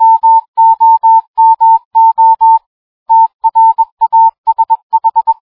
morse code

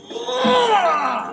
angry sound

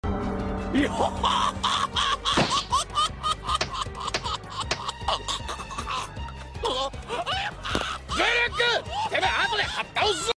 choke laugh; brook

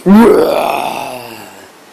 raaaaaaa

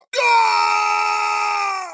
fall scream; mk9 kabal

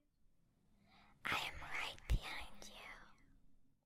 scary girl

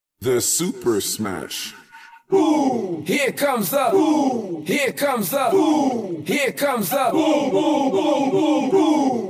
boom; super smash

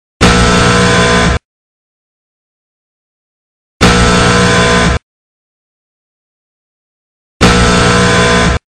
buzzer audience pov; agt

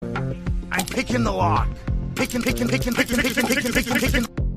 picking

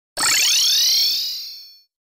teleport sfx; terraria

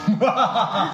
lad laugh

